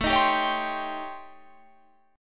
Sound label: A 7th Sharp 9th Flat 5th; A7#9b5; strummed